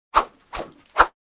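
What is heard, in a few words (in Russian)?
еще взмахи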